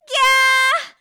ぎゃー, スポーツ少女

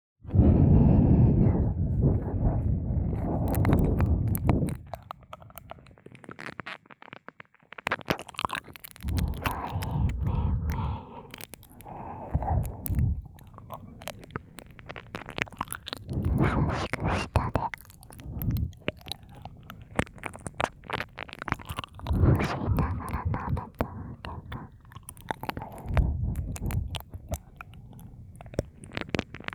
左耳レロレロ舐め, ASMR

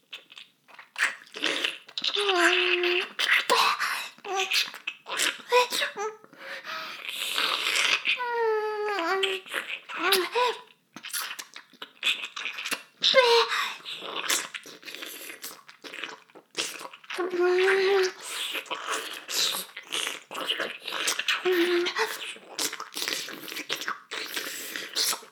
耳舐め音声; 少佐殿; 牧場戦腺

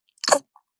引き抜き

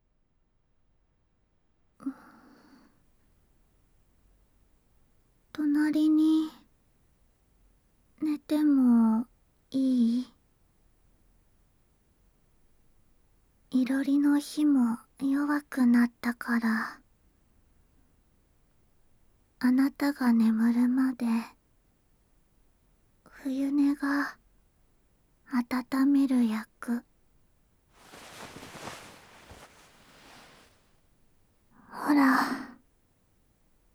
布団の中で耳なめ, ASMR

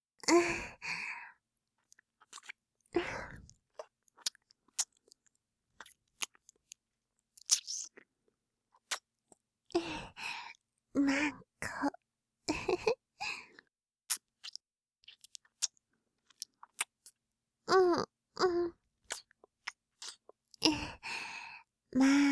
キス

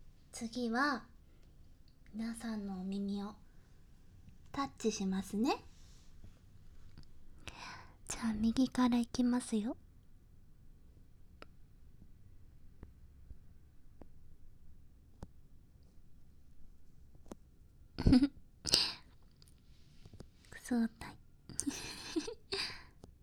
耳を触る バイノーラル, ASMR

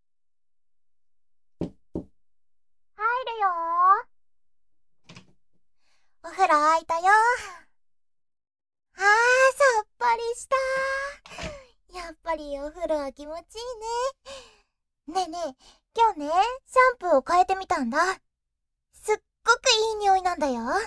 耳かきしてあげる; 今度は美羽が耳かきしてあげる♪

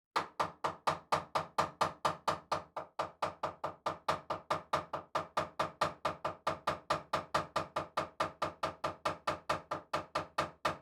切る 虚空 高速; KU100

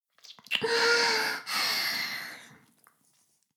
フェラ音声; 古き城のドラゴニート2

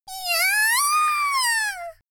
少女 絶頂「いやーー！」, 元気な少女

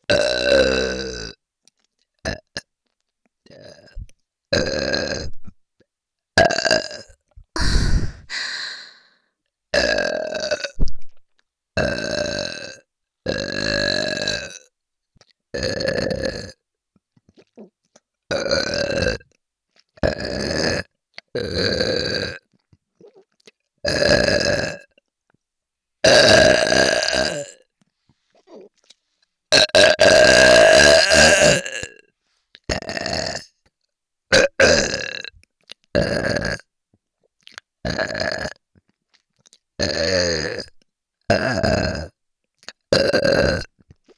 ゲップのみ; リナ

